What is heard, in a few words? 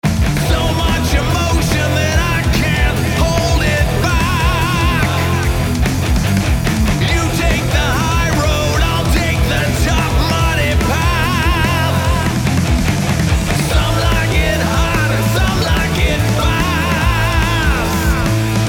Hard Rock Mastered